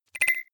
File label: deny click; maschinen; signale; soundpool